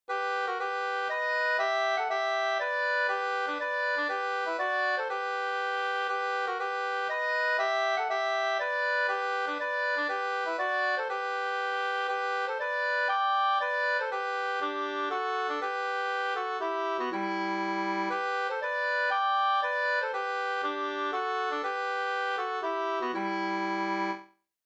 polska fr Lappland

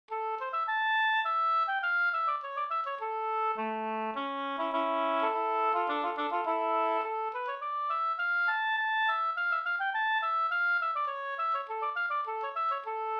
polska; Bergspolskan e Hultklappen Hs

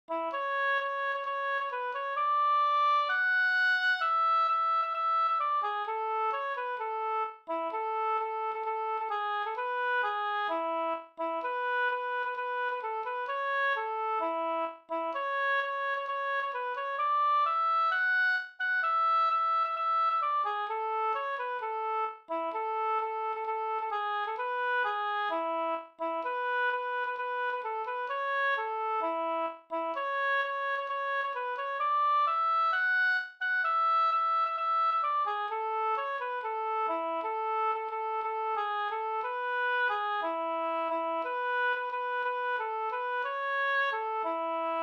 folkdans, Jagarmarsch Adur

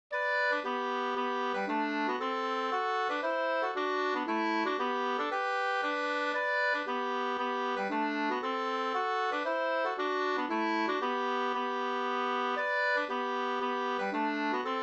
mazurka, 2st; mazurkalin e Ernst Abrahamsson Bh SvFolkdD2 p16

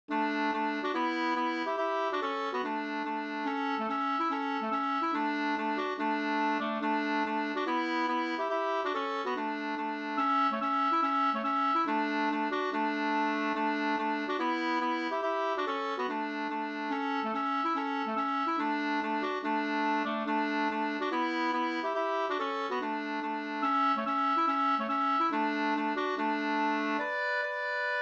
reinlender

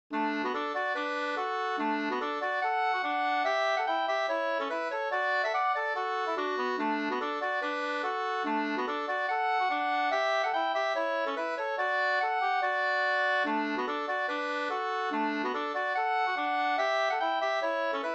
schottis; Hoschtlaten e Oskar Bergkvist 2st V1

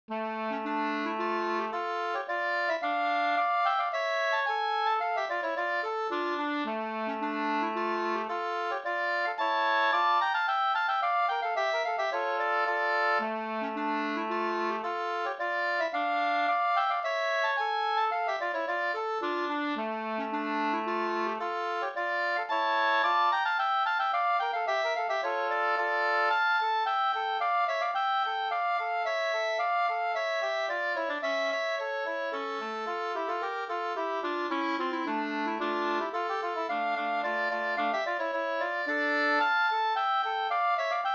slangpolska

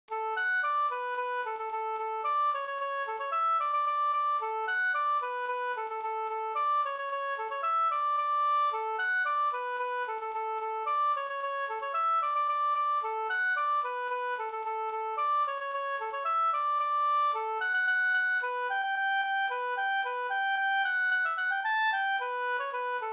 polka polkett; Oxmarschen Jarna Sd

